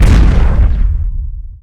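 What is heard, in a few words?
Explosion Medium Deep; Destruction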